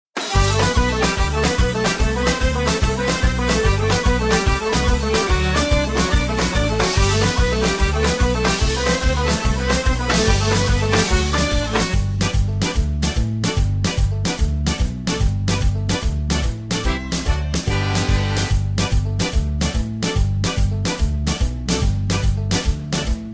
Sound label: (G) No Backing Vocals